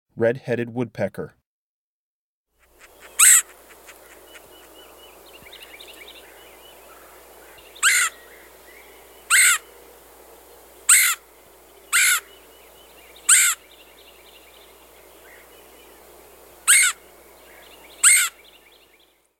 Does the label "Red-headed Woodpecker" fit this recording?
Yes